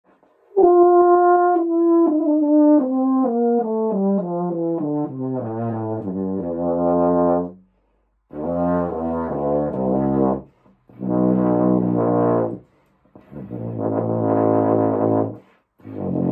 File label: Wagner tuba